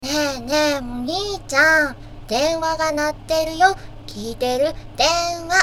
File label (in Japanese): denwa